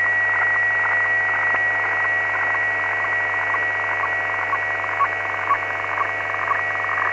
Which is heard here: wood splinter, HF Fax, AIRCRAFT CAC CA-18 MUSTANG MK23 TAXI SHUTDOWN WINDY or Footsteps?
HF Fax